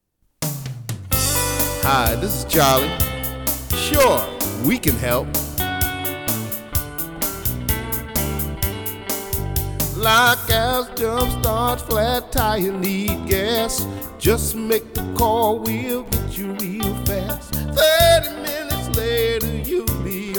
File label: Jingle